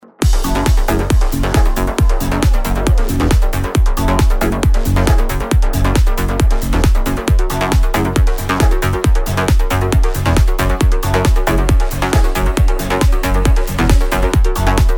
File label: bass; luscious bass soundset